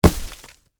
Snowball Impact, Weather, Snowball, Impact, Big; BlastWave

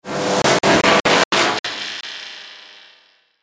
Stutter Wind Up Down; Production Element Imaging Element Accent Transition

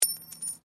Coin Penny Single Drop Stone; Common coins; Money; Objects

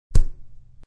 A body punch.; Punches and Hits; Combat; SFXsource

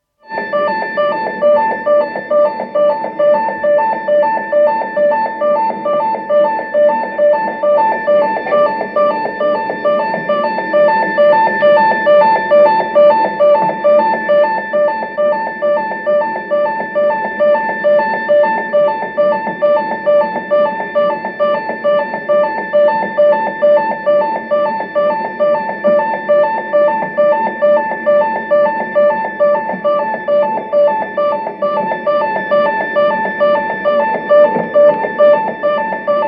Electronic Signals, Computer atmosphere; Computer Sound Effects; BBC

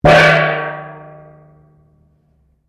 GONG 8 INCH F, Cheap brass gong; Gong Chau; Cymbals; Sound Effects:Musical; Foundation3

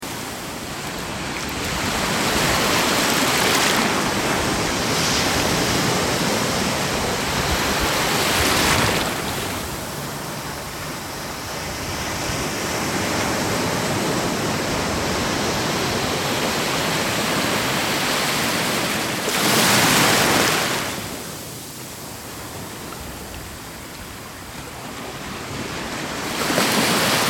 Ocean Waves Kamakura Japan, Ocean waves ambience; Smooth ocean surf washing in on shore; Ocean Sound Effects

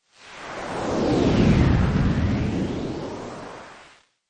Scifi Production Element Sweep Over; Scifi Production Element - Sweep Over. Suitable as science fiction film and game production

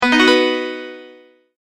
multimedia tone advance; Sound Design; McKinney Sound

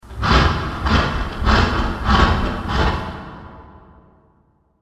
Digging In The Dungeon; fantasy sound effect; role playing games, magic videogames

